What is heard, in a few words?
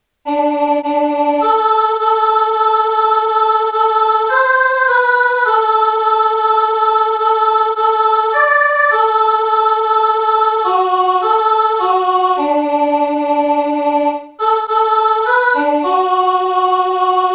soprano; Chanson traditionnelle des Appalaches